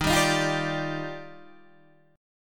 Eb Major 9th, Guitar DADGAD

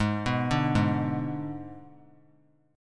Ab Diminished Ab, 3C, 3F, 3A, 3D; Abdim arpeggiated; JGuitar

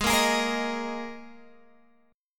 Gsharp Suspended 2nd, Guitar, Open G; 18,15,18,15,16,x

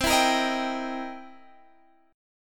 C 7th Sharp 9th C Guitar Standard; strummed; JGuitar

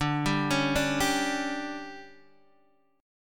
D-Major 7th Suspended 4th, D; arpeggiated

